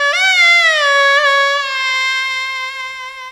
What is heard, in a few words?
World Lick